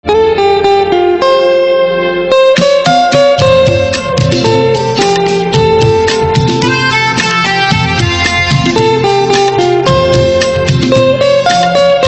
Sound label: Guitar